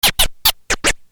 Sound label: Scratch; Sonic Foundry (Sony Creative Software); Hip-Hop Street Beats Construction Kit; MAC MONEY